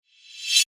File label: Magical Reverse, Shimmer; BlastWave